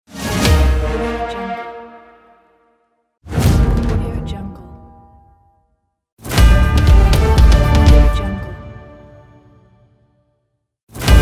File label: battle logo pack